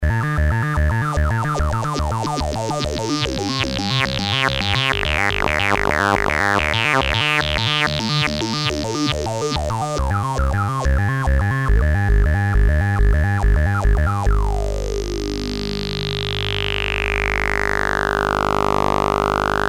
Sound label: phaser